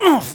pain sharp; Scout

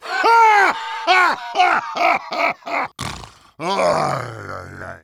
Heavy laugh big snort

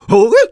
exert; Eng; taunt

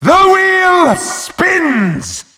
wheel spin

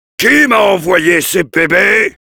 yell

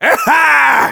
laugh short, de; Demoman

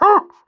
laugh short; Pyro

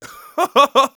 laugh happy, de; Scout

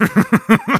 laugh happy, de; Sniper

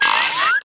spring rider squeak; Taunt

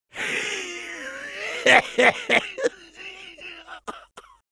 laugh long, fr; Soldier

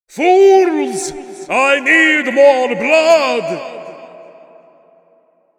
Dracula portal open

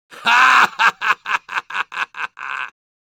Soldier laugh long

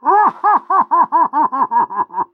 Pyro laugh happy fr

